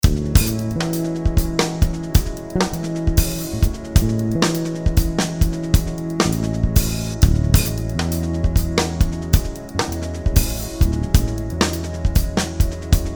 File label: D lydian Pedal